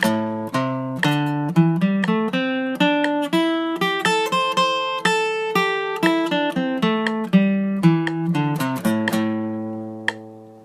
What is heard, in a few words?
Am Three Three